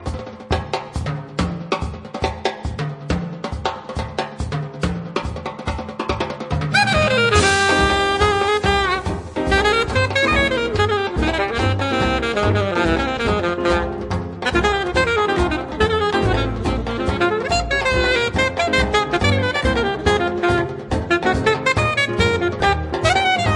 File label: Swingin The Samba